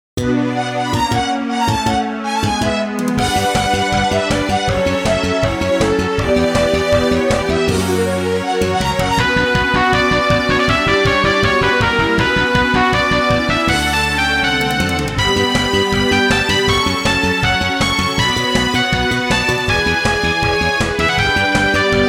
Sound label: battle; romasaga